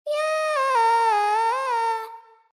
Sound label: pitch bend tutorial bended notes; gumi